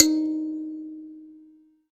kalimba d#, Thumb Piano; ambient; Chillout

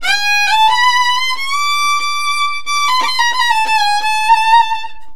VIOLIN, Balkanish Traditions

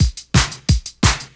Disco, 175, Breaks; Drum&Bass; EZ Rollers

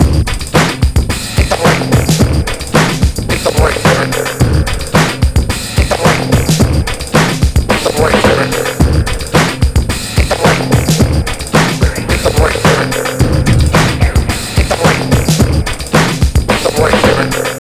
BREAK 109 bpm; HIT THE BREAK; 90 s Sample CDs; Planet of the Breaks

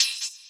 Open Hhat, Hihat; Equipped Music; Loungin House

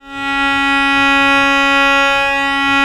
VL C#3, VIOLE, Historical Instruments; USB Soundscan vol.23

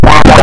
FX GTR, GUITARS KIT

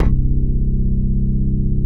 PUNCH BASS

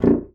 bassoon A#0; Xsample VOL-6; C BASSOON

